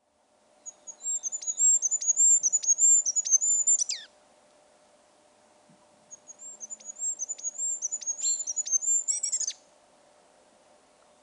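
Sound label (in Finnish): hippiainen, lintuäänet; Hyvin korkeita ja kirkkaita ääniä. Laulu korkea toistuva veivaava säe; birdlife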